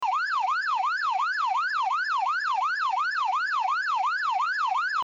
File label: siren